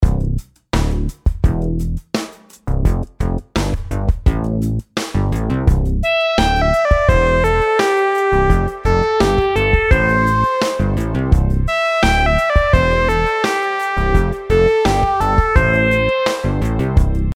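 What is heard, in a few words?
melodies simple 8s triplets C major; melody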